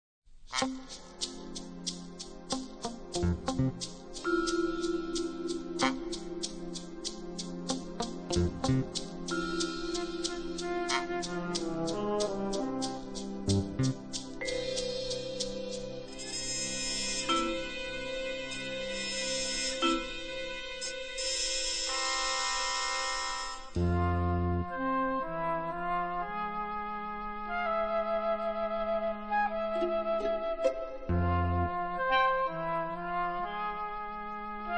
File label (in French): Musique d'attente